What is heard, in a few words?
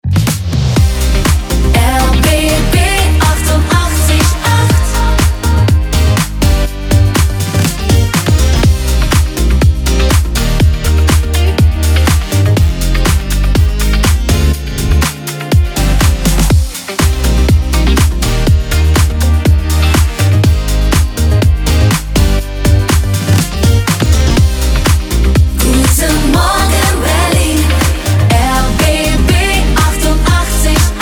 Morning Opener